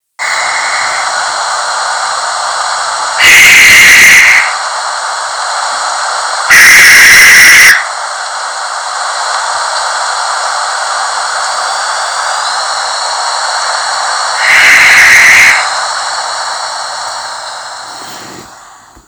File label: Barn owl flight call